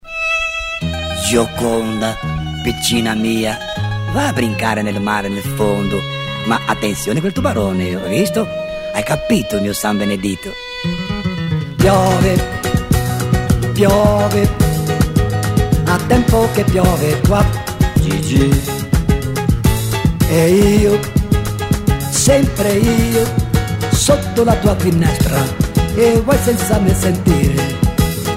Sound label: Samba italiano